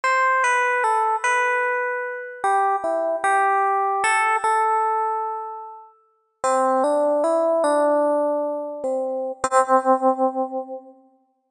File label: Rhodey